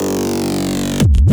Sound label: break kick; Happy Tekno Track Contest SamplePack